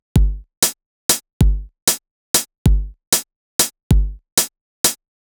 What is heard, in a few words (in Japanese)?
トレシージョ